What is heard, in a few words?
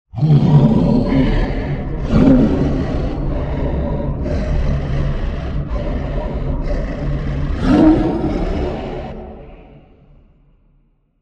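sounds of demon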